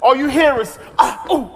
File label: all you hear is ahh ooh; Voice